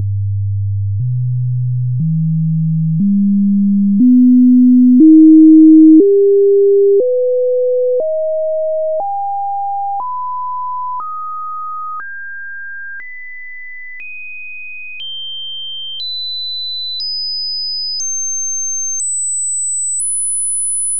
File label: multisine processed